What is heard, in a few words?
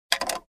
Loose clanging Sound